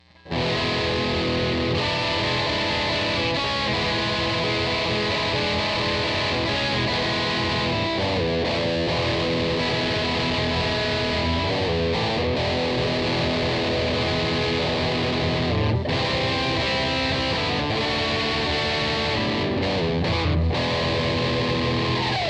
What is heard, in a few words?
electric guitar; Some Clarity